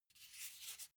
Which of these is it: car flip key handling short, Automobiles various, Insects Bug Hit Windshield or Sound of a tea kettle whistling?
car flip key handling short